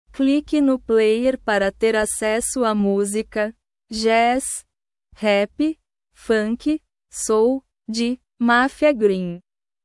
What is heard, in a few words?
jazz rap funky soul